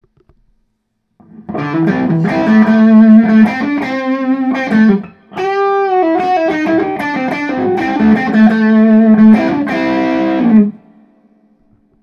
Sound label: LP92 OD mix both 490R 498T, gibson les paul